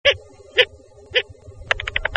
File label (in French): Hibou Des Marais; strigides; Oisellerie Galan